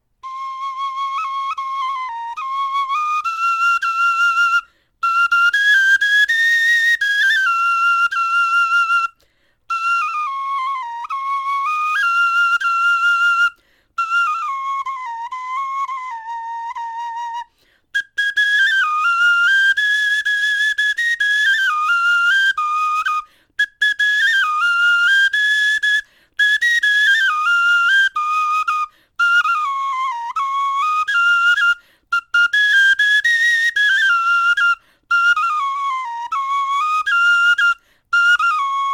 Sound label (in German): melodie, knochenfloeten